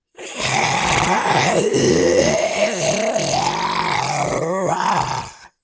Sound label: zombie nasty